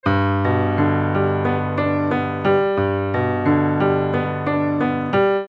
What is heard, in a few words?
piano, omni; Electrovoice Dynamics